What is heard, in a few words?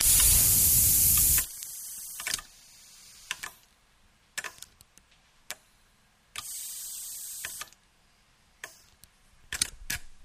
Anamatronic Head Air Hiss And Clix; gears